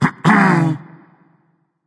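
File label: nonverbal clear throat; smite; voicepack; merlin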